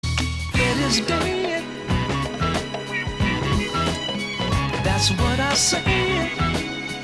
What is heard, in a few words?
vocal